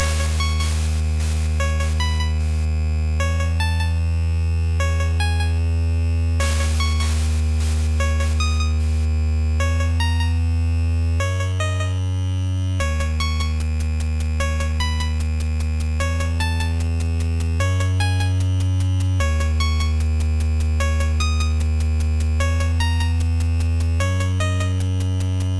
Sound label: Underground; Candy S Adventure